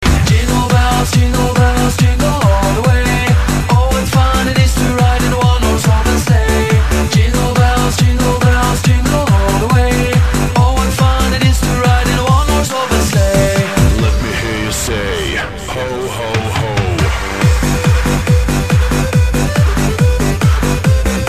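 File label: Jingle Bells techno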